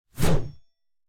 Heavy Blade Swing; Scrap Weapons; Zomboid

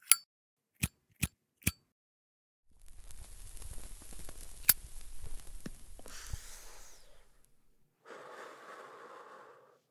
smoking lighter; Zomboid; Smoking Sound Overhaul

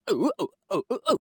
Man Dance Happy; Zomboid; Lifestyle

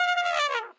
Trumpet Failstate; Instruments

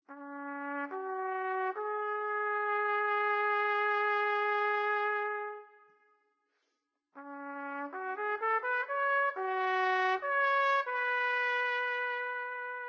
Trumpet Leaps and Bounds; Instruments

